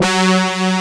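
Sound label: brass G2, synthesizer, analog brass; AKAI S6000 CD-ROM - Volume 1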